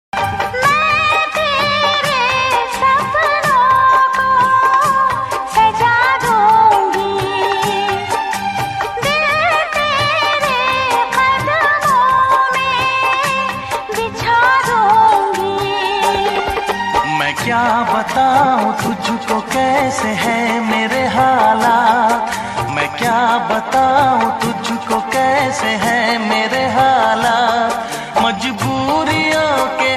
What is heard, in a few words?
Old Ringtone